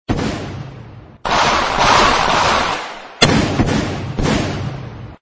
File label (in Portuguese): Tiroteio, Vinheta